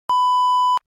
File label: censor beep